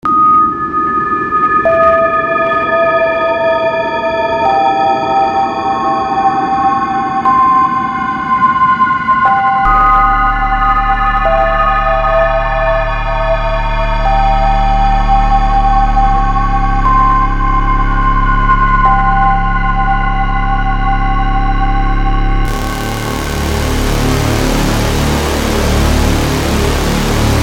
Dark Ambient; из туториала по Dark Ambient

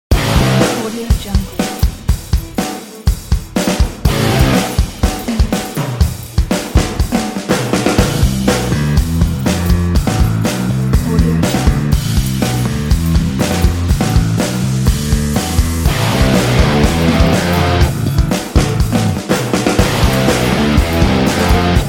Metal Rock

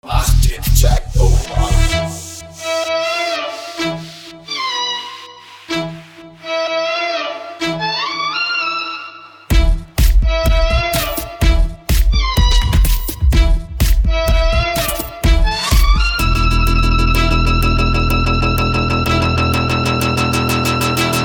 Techno Rock

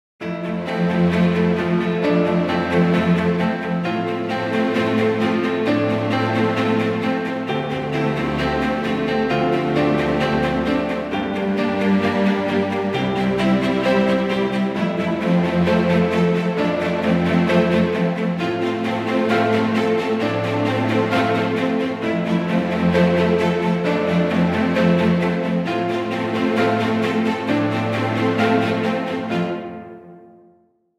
Inspiring Piano Background Music

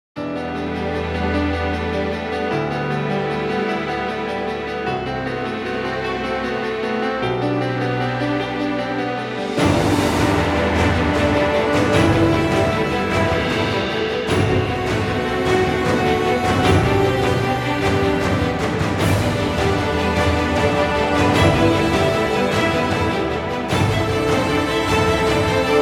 Epic Music

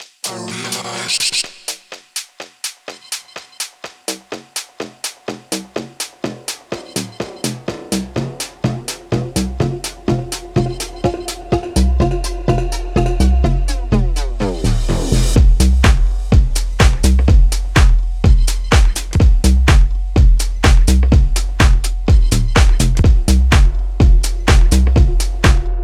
techno loop

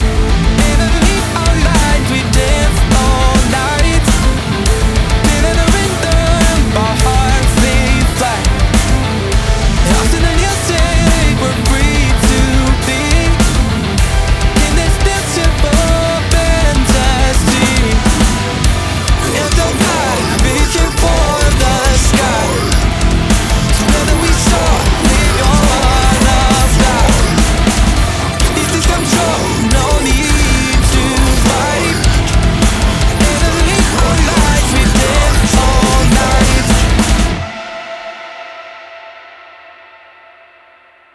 Metal Minor; Конкурс Усача

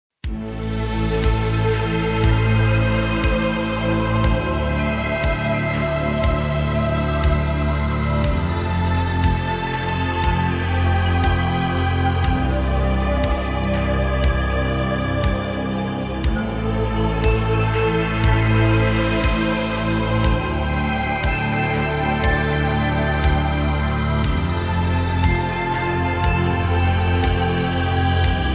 Magical Gravitation, Background Music

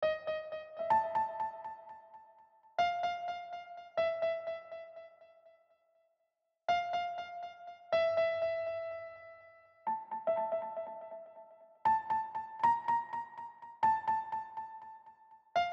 PIANO

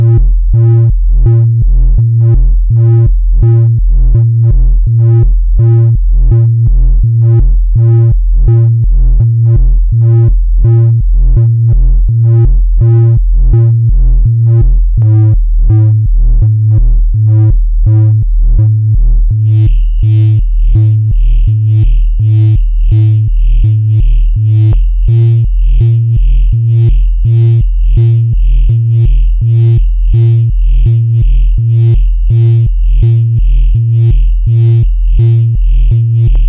trance Bass Noise; Dmitrij Soundbox

